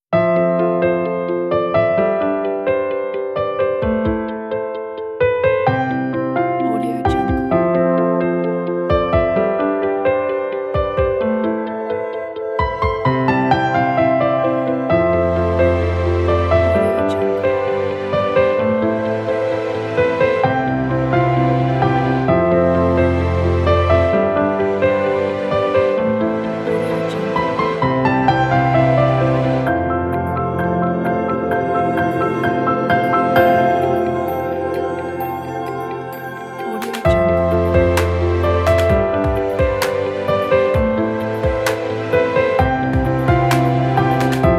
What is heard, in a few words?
background cinematic piano